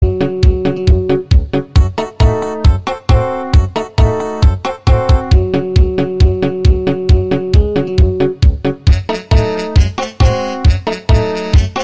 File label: light music ringtone; sony